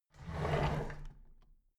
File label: drawer big clatter open heavy